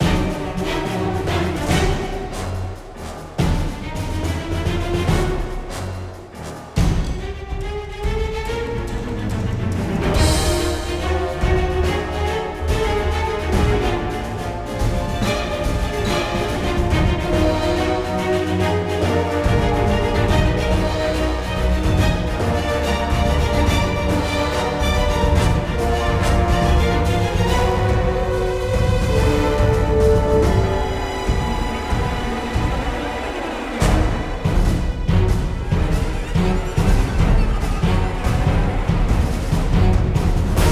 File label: Arena Castle Interior Combat; Dungeon Hunter 3